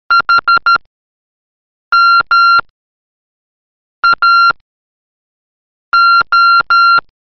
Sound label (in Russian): ХМАО МОРЗЕ; vasilkov virt mashina